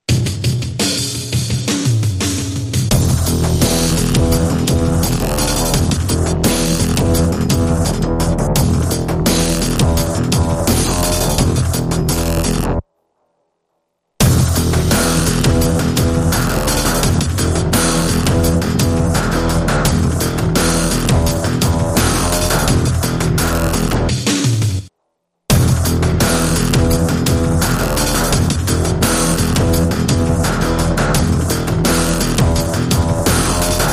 Drums and Bass Mix

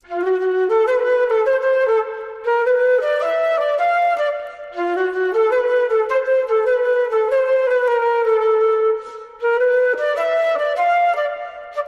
Flute

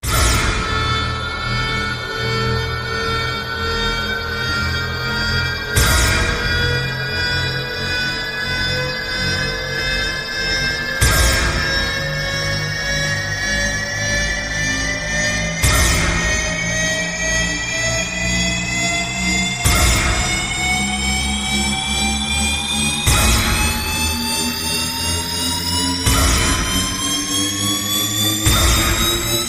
Rise Rhy; Rise Rhy Flying Saucer

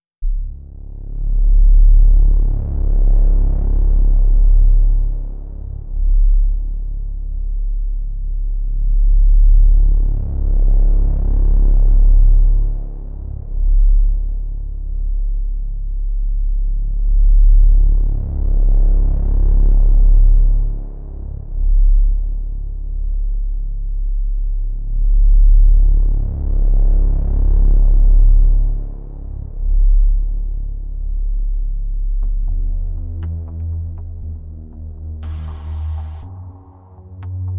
Sub And Bass

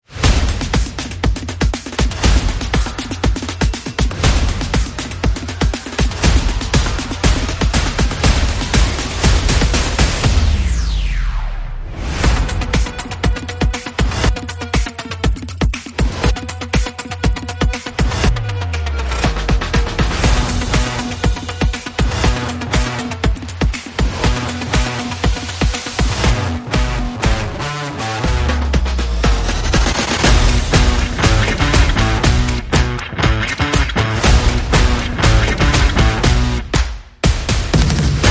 no horns

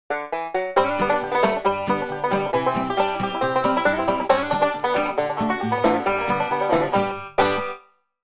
Banjo Short

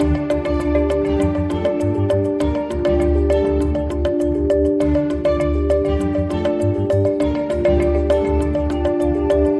Loop

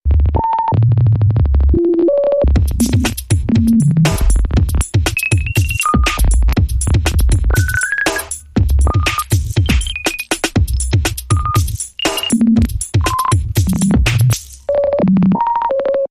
Signal Code Sci Fi Bumper

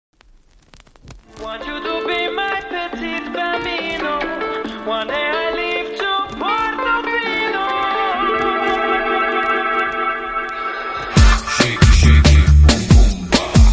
Short Dub

